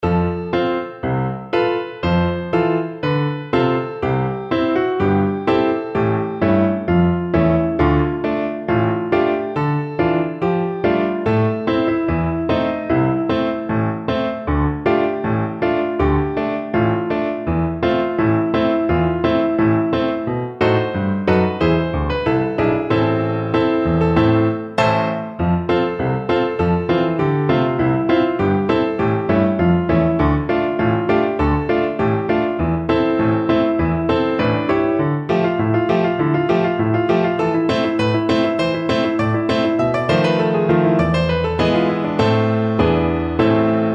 turquoise bracelets, piano

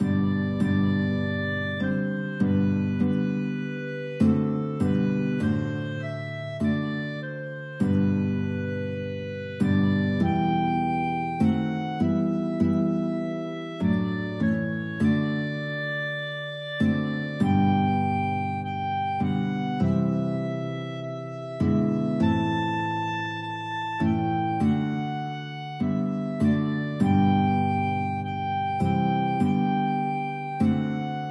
guitar clarinet duet; it is well

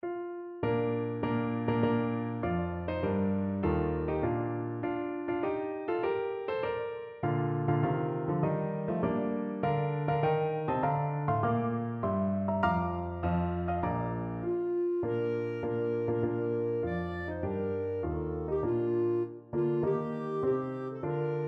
clarinet; heart of oak

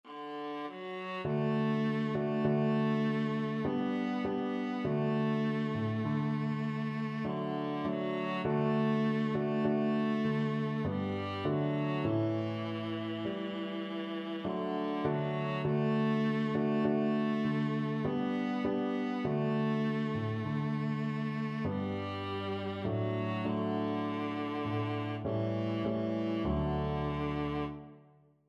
viola

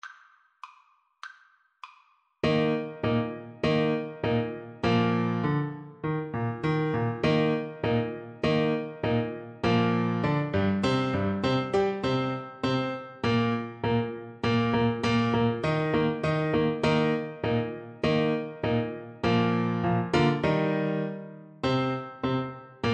Turkish March trumpet; Beethoven; Ruins Of Athens Op113

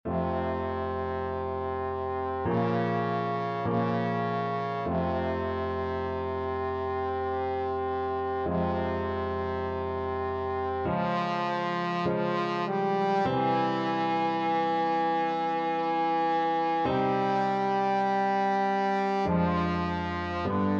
trombone

